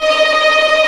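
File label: Vio tremolo D#4; Digital Sound Factory Virtuoso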